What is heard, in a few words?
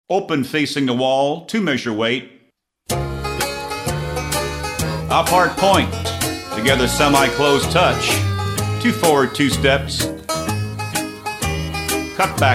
vocal sample